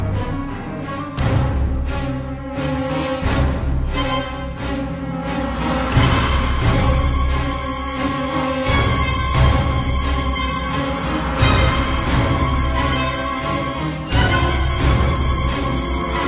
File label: countdown action soundtrack; heavy rain ost; mars